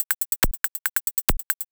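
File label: DRUMLOOP ELECTRO 140; Techno2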